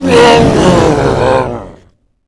dying; morbus

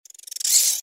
giant rat hiss; npc

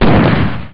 turret

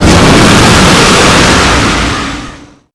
dragon threat short

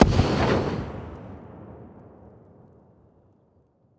m203 detonate smoke far; ins2; weapons

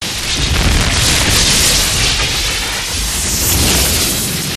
gunstand flamethrower fire flail loop, turret; gow; turret judgement

